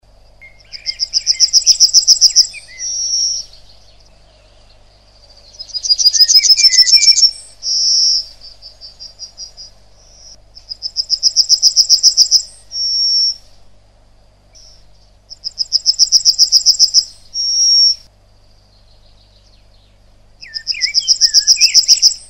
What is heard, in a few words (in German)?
Goldammer, vogelstimmen; vogelstimmen-trainer